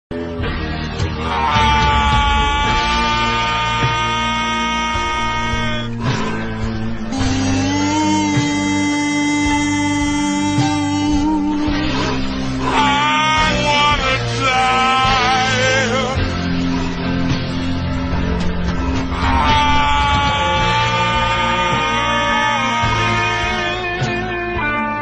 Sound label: aah